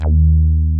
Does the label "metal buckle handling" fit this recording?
No